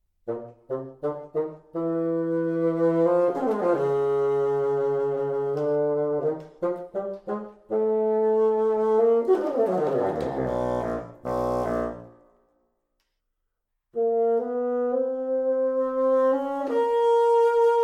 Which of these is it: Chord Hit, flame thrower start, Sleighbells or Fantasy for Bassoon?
Fantasy for Bassoon